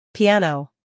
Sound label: piano